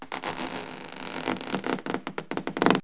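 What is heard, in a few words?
coarse rip; Wired for Sound Pro CD; Aristosoft, Inc.; rip tear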